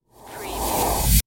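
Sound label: Production Element Reverse; Sound Effects Bible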